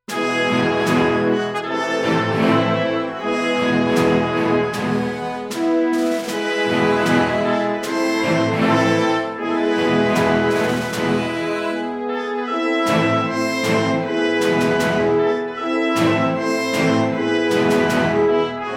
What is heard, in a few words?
Victory March